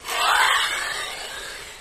Hydraulic Machine, trunks & hoods

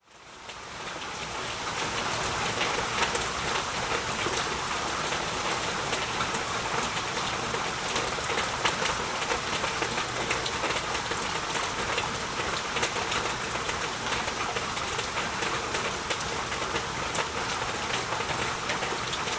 rain light; rainfall

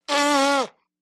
Baby Girl Animal Sound, nineteen month old

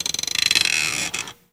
Chair Creak Lean Bac, squeaks & creeks

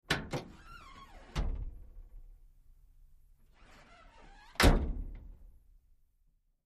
Truck Tailgate Close, dodge ram v 10

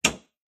hammer hit

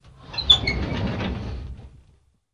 Drawer Metal Open Qu; drawers

